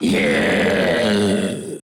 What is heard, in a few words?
Doppelganger Death